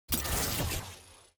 tier wings promotion; ranked; from gold

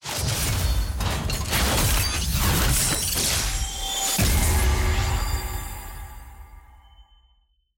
tier promotion gold to platinum; regalia

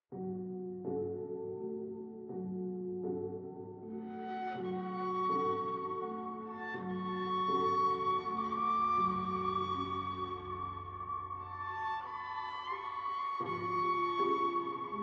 theme; drmundo

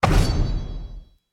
match accept; jade

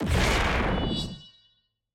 sfx eye; samira

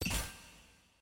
ui button icon click; tft

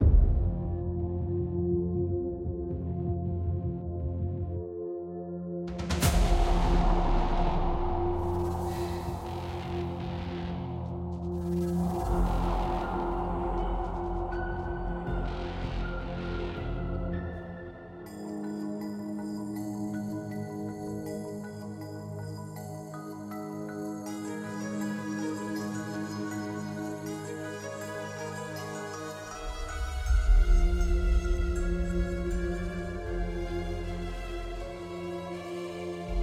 theme

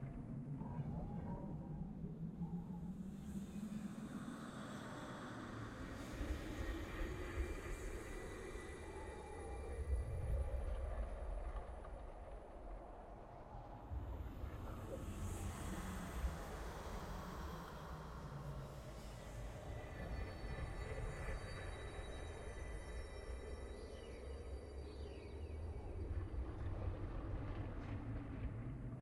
amb targon mid hill

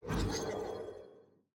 lightsaber; perks

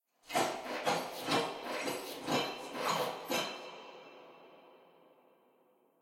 walk moonwalk

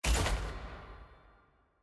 sfx ui collection artifact click; jayce

